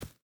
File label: footstep barefoot dirt run; Rust